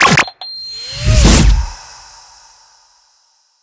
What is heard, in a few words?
Steam Inhalator; Plastic Surgery